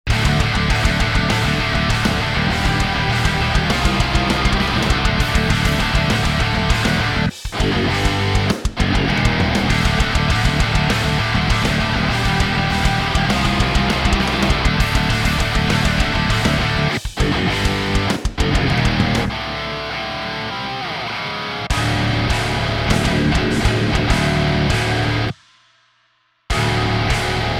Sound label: riff with band